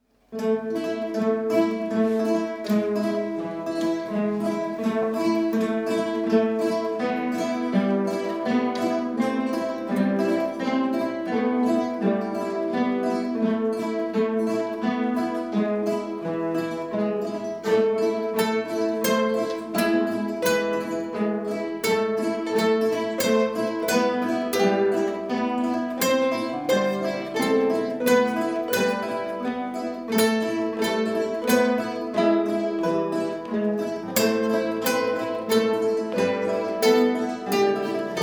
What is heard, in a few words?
flamenco